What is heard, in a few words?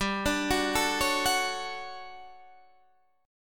D-Major 7th G; DM7/G arpeggiated